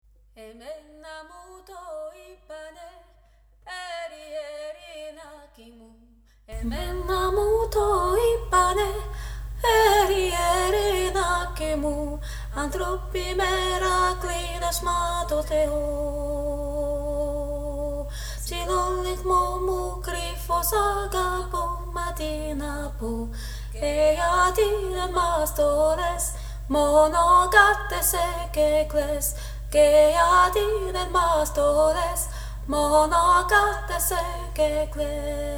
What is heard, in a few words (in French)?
voix haute; Trad. Grec - Danse Kalamatianos